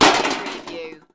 Metal Debris Impact Settle

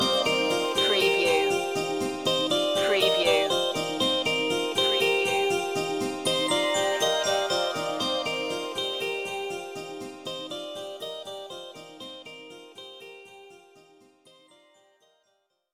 loop end

